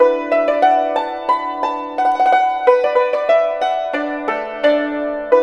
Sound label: YNCHIN 90 D; INIS - Opium